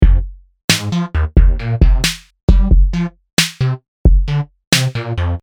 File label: Beat